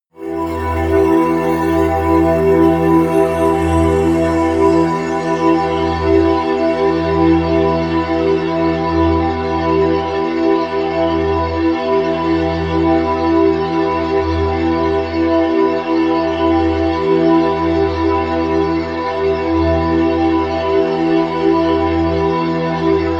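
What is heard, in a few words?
bright high, pads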